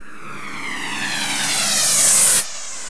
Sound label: SFX, Drum & Bass 170 BPM; Ueberschall; Drum & Bass Flash Trackz